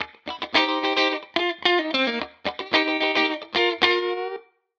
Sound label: Guitar Line, 110bpm, hiphop; musicradar